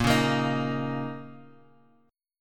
Bb Minor Double Flat 5th, Guitar, Open G

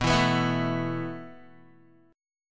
B 7th Suspended 4th B, Guitar; B7sus4; strummed; 7,7,9,9,9,x; 4E,4A,5D,5G,5C,6F

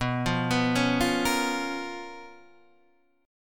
Db 13th B, guitar

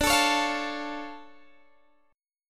D Minor Major 7th D Guitar Open G

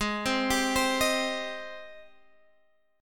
G sharp Major 7th G sharp

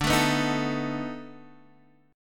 B 7th D; B7/D strummed; JGuitar

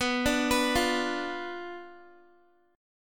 B Diminished B down Guitar; arpeggiated; tuning 4F,4A,5C,5G,5C,6E

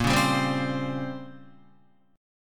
Bb Minor 6th Add 9th Bb down Guitar; strummed; JGuitar

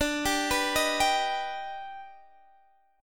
Eb Augmented Major 7th D

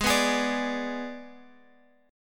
G sharp Diminished G sharp, Guitar Open G; 18,16,18,19,x,x

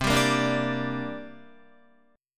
C 7th Suspended 2nd C down Guitar; C7sus2 strummed

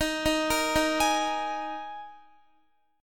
D sharp Suspended 4th, Guitar; x,18,15,15,15,16; arpeggiated

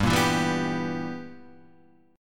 B Major 7th Suspended 4th Sharp 5th G

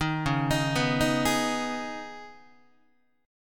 C sharp 7th Suspended 2nd C sharp down Guitar; C#7sus2; arpeggiated; JGuitar

